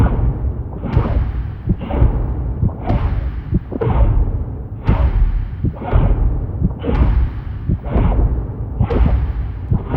Vault Heart Beat Loop; Ambience; Warframe; Infested Eris

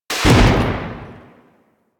Pistol Tail; Warframe; Tenno; Guandao

